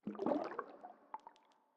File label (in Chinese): 水下细水滴声; ambient